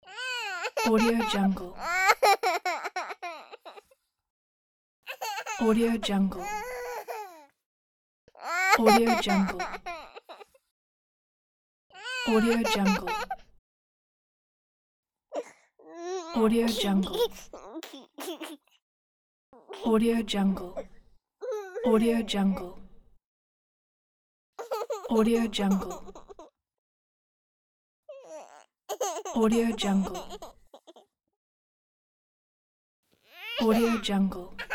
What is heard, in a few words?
baby girl cries